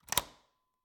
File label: Cabin, front door, deadbolt, lock, latch, thick, open, strong; Eiravaein Works; Vaeyan IV